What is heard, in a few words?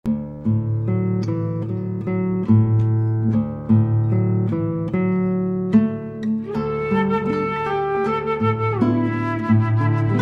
lullaby